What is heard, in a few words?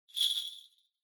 Ring Bells Dry Shake